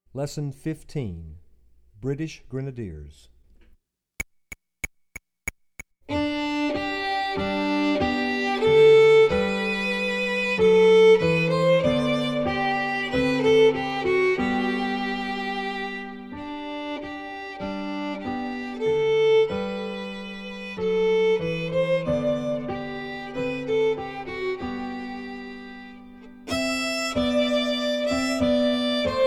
British Grenadiers

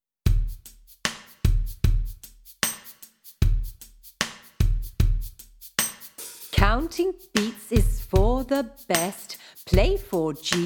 Counting Beats

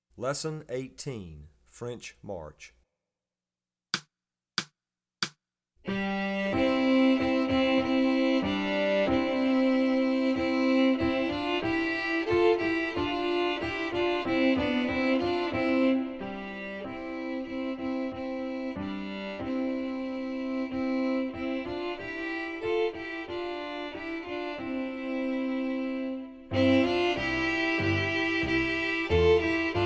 French March

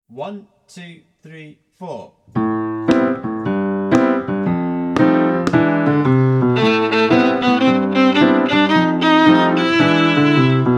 W. Violin; Canciones de pociones mágicas

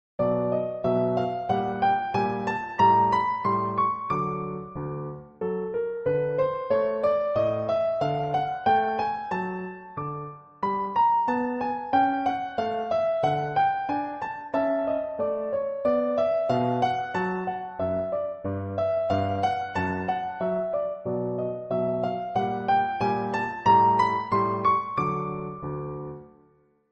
Accompaniment Track

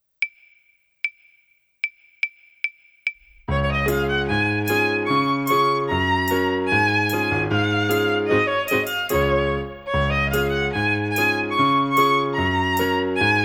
Old English Dance

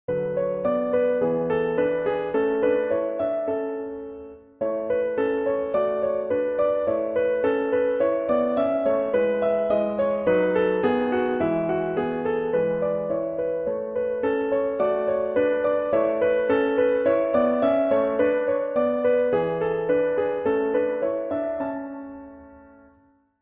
Accompaniment Track

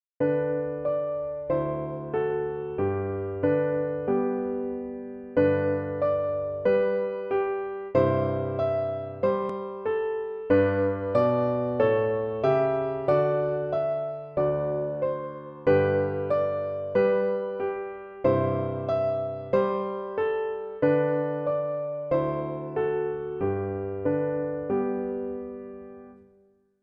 Accompaniment Track

